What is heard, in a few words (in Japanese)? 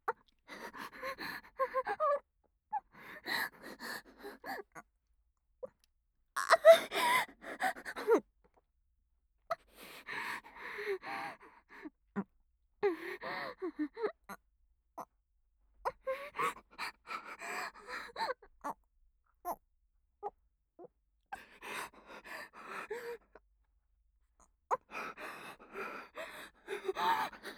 こらえ喘ぎ, ASMR